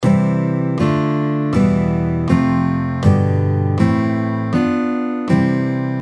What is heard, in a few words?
C Major Chord Scale, guitar